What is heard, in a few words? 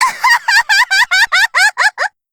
laugh; nemu